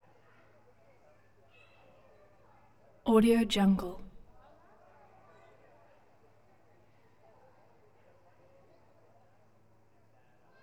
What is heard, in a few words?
distant forest party with children